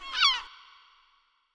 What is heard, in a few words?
Icy Bird; ImpGal2; colony